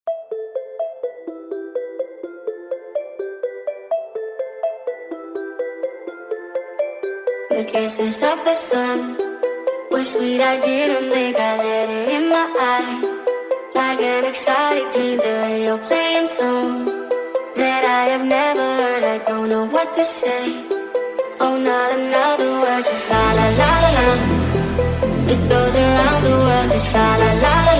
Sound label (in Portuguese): som automotivo